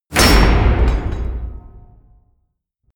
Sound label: hit metal